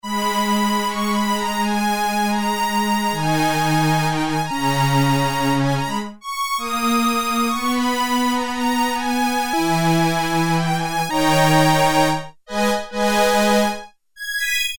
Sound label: High Strings 4', Korg Poly-61M